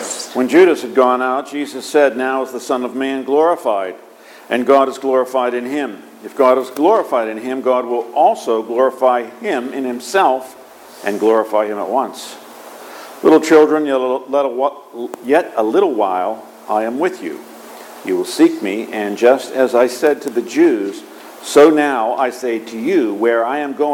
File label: Gospel